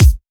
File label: Bassdrum; Vengeance Samples; VEE Bassdrums